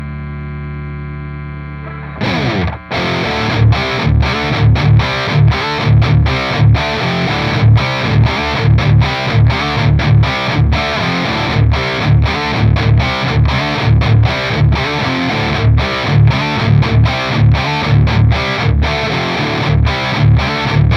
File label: Behringer 1273 EQ